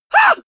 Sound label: male terrified scream short; comedy-cartoon; soundpool